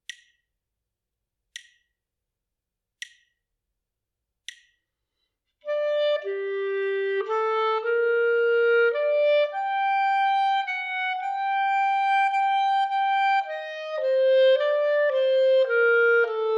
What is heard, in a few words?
melodic error detection; ear training